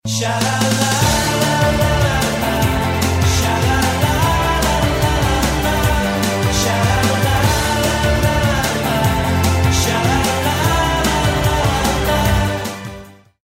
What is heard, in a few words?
shalalala, chalala lalalala